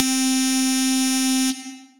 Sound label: Digi Vowel, Synth Lead